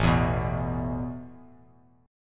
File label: A Suspended 2nd, Bass Standard; Asus2; strummed; x,0,2,4; JGuitar